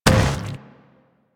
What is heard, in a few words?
Zombie Step Metal, Footsteps, Walk; Warframe; Infested